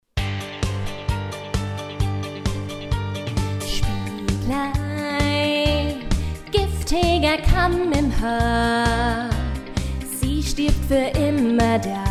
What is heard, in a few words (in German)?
Spieglein Reprise Vollplayback; Schneewittchen